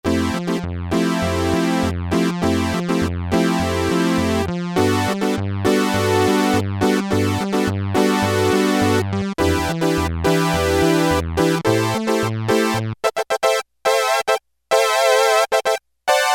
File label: Poly Synth, Roland W-30; Factory Performance Patches